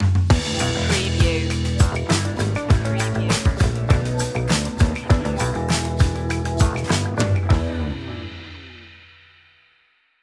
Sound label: feel the funk stinger